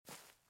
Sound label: SFX step grass